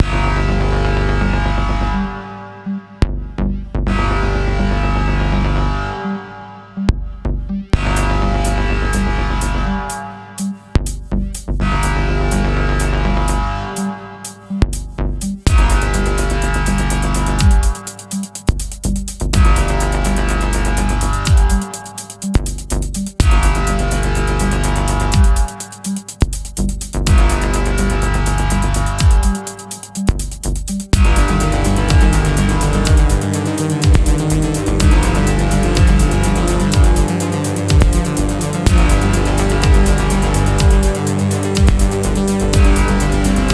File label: Music Nemesis Fight loopbreak 124 15 bpm